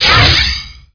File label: gun fire; MOTS